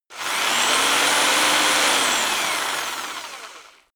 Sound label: rock drill rev high long; bohrer; soundpool